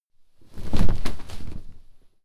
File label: clothes low hit drop